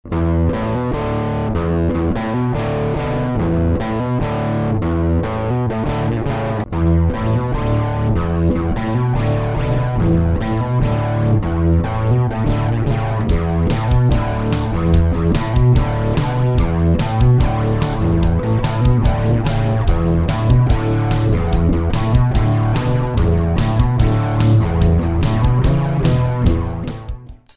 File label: metal drive overdrive; EBS